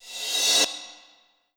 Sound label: Reverse Cymbal